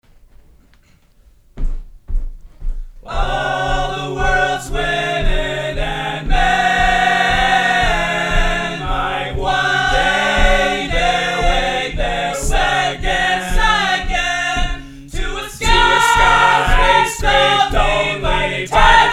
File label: opening hymn; the wilderness